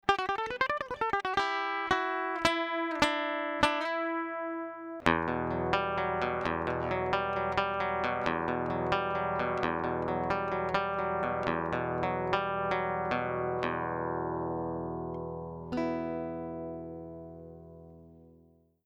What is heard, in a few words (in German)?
Acc EQ und starker comp; Ibanez